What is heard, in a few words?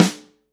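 snare, EMU Orbit-3 drum machine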